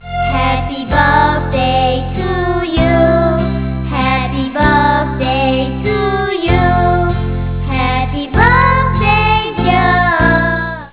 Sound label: children